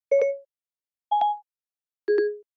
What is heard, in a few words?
Siri Activation Sound Effect